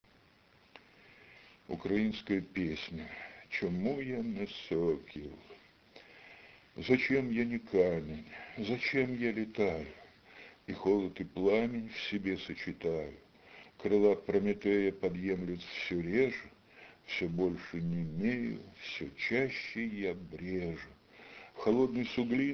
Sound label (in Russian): Украинская песня